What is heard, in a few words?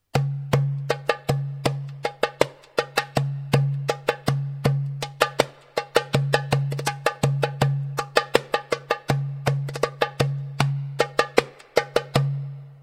darbuka; notations